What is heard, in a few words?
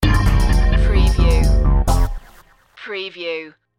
sting